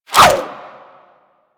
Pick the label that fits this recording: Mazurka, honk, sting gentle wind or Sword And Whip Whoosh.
Sword And Whip Whoosh